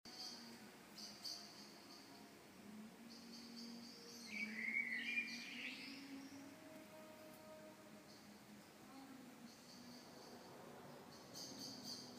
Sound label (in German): innenhof hospitalstrae